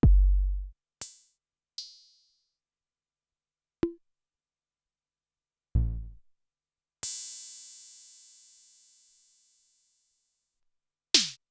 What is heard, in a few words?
pop; Sonic-Potions LXR